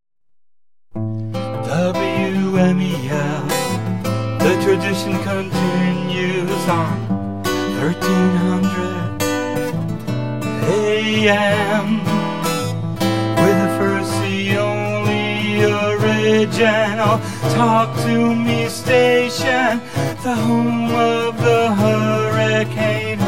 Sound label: Jingle